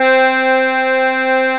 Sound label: Accordion (C3); X-Wing Patches